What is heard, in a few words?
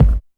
BD, Dance Mega Drums, HIP